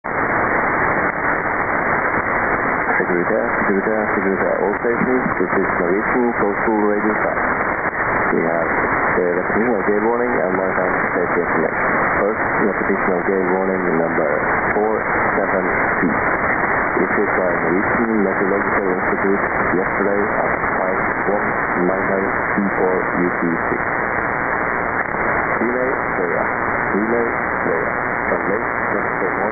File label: Norwegian Coastal Radio South sign on; LGQ Rogaland Radio